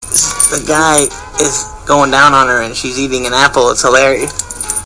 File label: eating an apple ringtone